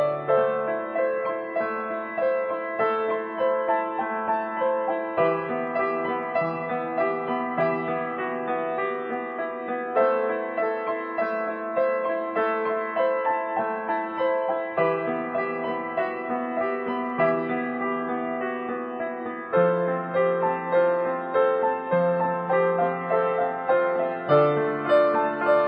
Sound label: Piano Lullaby